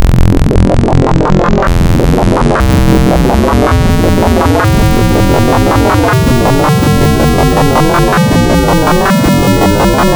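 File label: FM Soft Square, wavetables; Architecture 32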